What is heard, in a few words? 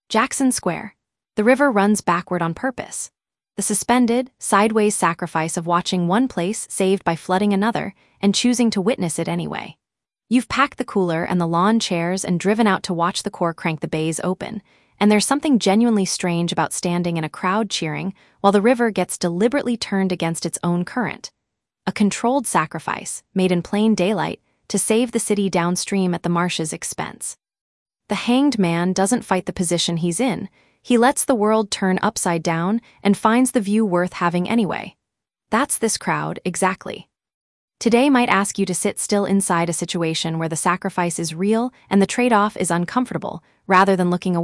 crowd upright; the spillway crowd; louisiana